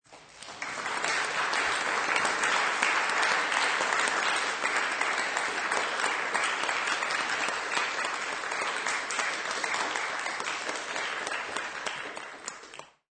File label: Applause Auditorium